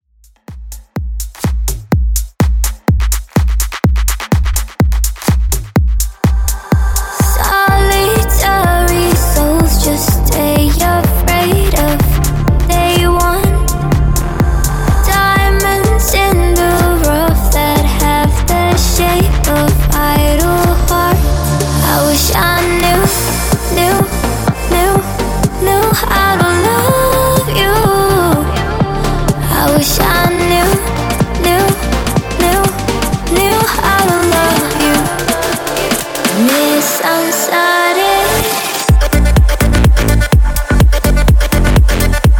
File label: In Outro Club 125 Bpm Clean; djyan